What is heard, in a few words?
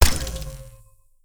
mech a xbow